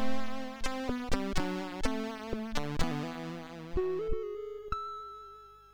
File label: sid MOS6581R3